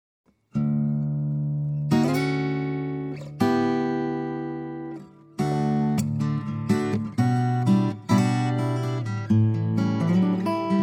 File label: Guitar